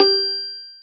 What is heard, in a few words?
ding